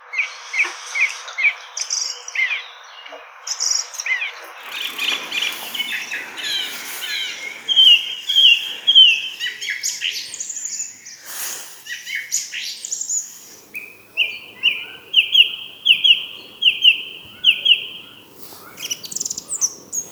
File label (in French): Grive musicienne